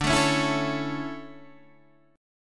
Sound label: D Major 7th Suspended 2nd, Guitar Standard; strummed; JGuitar